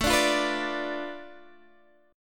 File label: B Minor 7th Flat 5th A